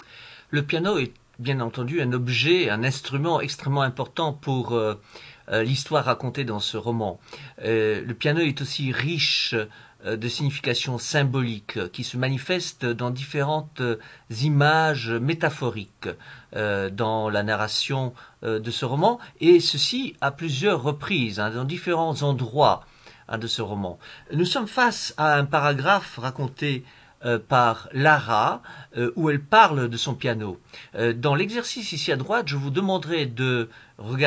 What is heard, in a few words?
Polyph piano